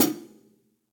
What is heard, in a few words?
Hihat Foot; Drum Hits; Standard Hits; Studio Basic; Ableton; Factory Packs; Session Drums Studio